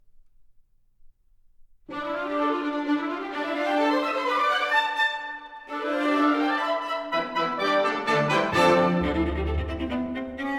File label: symphony concertante, fast with energy, orchestra